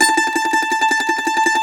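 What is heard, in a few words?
Mandolin tremolo, Plucked Strings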